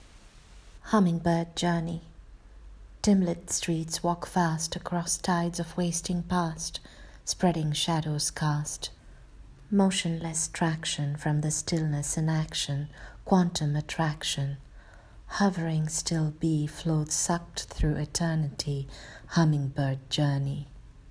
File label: humming bird journey